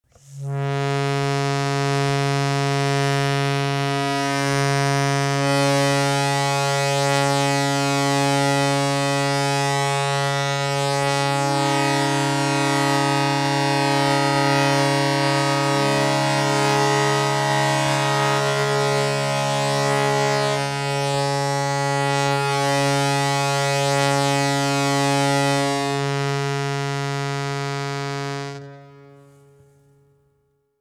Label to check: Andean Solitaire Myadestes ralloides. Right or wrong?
Wrong